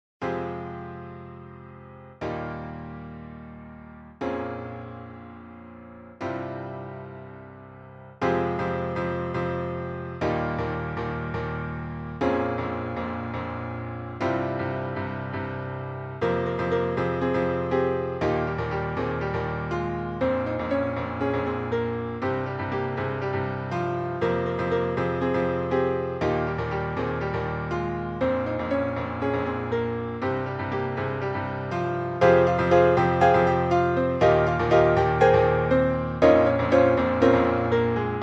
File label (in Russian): Ноты для фортепиано